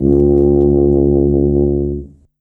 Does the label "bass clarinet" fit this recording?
Yes